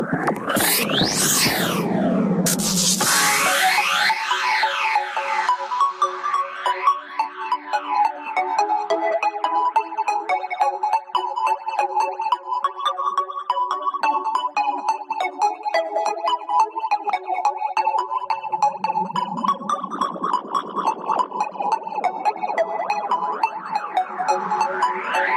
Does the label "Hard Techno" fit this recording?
Yes